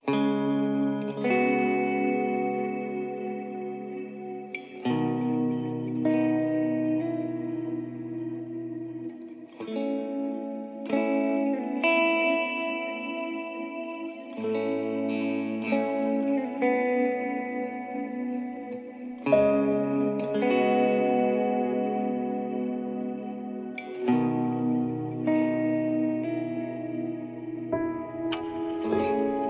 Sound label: Rain From The Window, Ambient